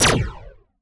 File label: laser; spacemap; lasers